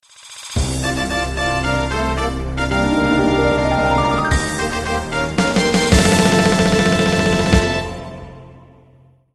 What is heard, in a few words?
Boss Clear; StormatuaryCastle; smbx38a; The Great SMBX 38A Contest II